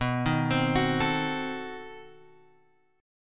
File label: B 7th Flat 9th B Guitar Open F; B7b9; arpeggiated